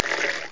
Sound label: Tap